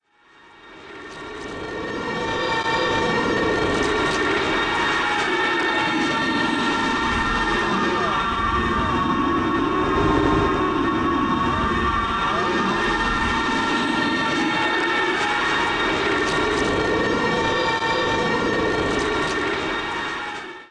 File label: scary loop